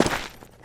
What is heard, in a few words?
gravel, footsteps; Counter-Strike Global Offensive